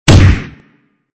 low impact

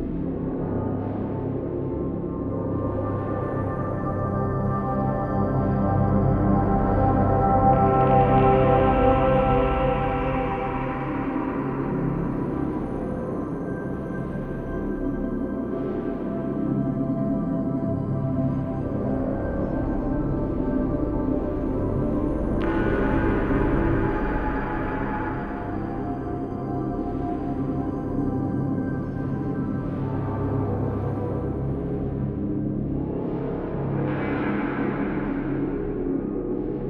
Calm Loop; Void Bastards

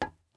glass step

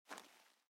jump generic; Out of Reach: Treasure Royale

